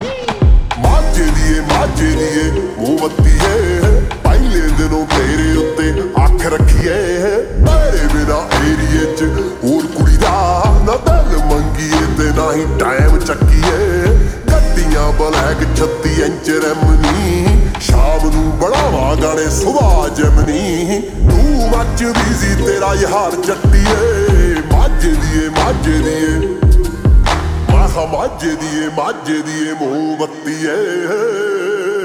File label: Ringtone slowed and reverb